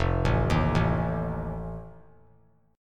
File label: F 7th F down; F7 arpeggiated